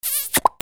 PEOPLE

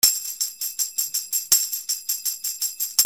RHYTHMIC

